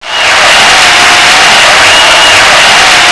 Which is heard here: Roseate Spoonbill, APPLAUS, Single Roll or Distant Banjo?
APPLAUS